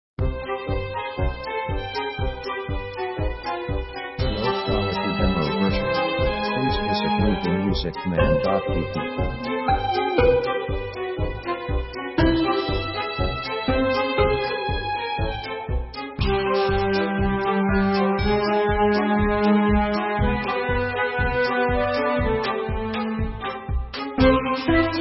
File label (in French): la vie est drole, comedy